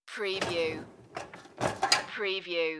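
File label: Open Dish Washer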